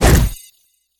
Abomination Melee Magical; Kilta

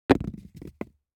DICE on Hard Wood, Throw and Roll, Foam, Two Dice; Samples; ArticulatedSounds; DICE Sfx Party (Extended)

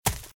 Footsteps Leaves Sprint Right; RadwinIsland

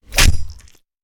Stab Bayonnet; Sound Ideas; Guns

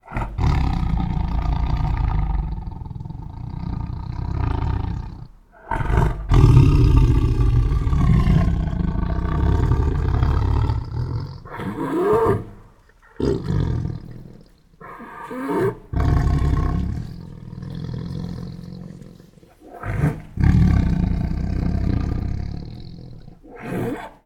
Throaty Breaths and Scary Growls, Tigers; Sound Ideas; Tigers Sound Effects

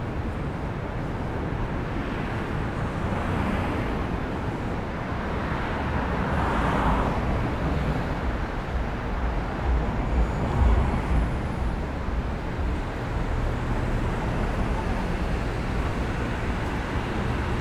Urban day traffic people plane; urban and suburban; boom library

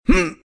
hurt; RadwinIsland

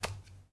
hands on a wall; Apotheorasis

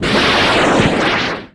Torpedo Shot, Weapons

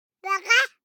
BABIES TALK Boy 12 months; Baby Boom

